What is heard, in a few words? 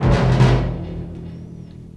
Acoustic Percussion